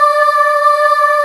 choir

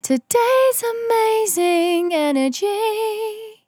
On A Star 65bpm F, Vocal; Zero-G

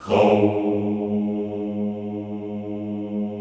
HOUUU, Classical Choir; Best Service; Extended Classical Choir

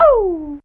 Ooh; Fairlight CMI IIe; Humans; Humans2

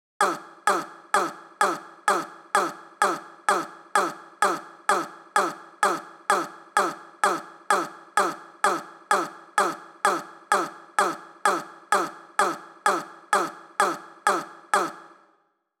128 BPM Vox FX; Vengeance - Essential Clubsounds Vol.5; Demo Constr Kits; Big Line Kit Root G

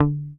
HOFNER MUTE, Bass

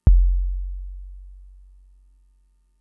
kick, Fricke MFB-522

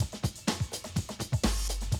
125, Classic Drum Loops

